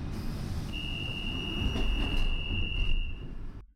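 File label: Door close, Train; OpenBVE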